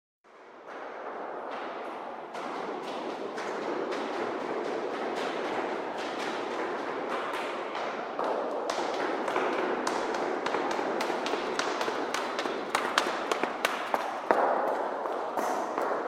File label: Footsteps By In Echoey Stairwe; Hollywood Edge Historical Series; Traffic Free BG's & FX